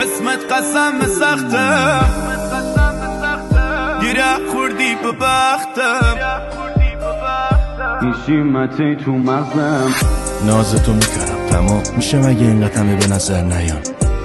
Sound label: Remix